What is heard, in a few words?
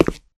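Grunt Movement Footstep Walk Concrete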